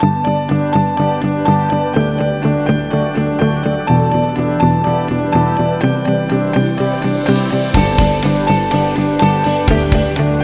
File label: The Inspirational Corporate; corporate music vol 11